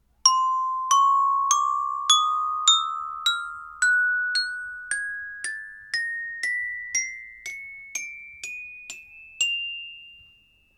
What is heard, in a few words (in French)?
Carillon Chro Do Fa, 2 Carillons Chromatiques Do Fa